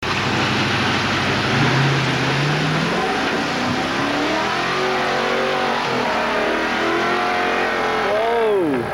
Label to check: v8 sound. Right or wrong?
Right